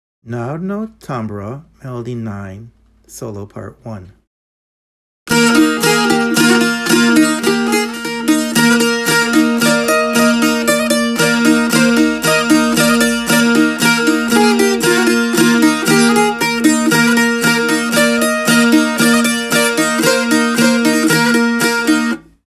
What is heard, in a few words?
tambura solo; Narodno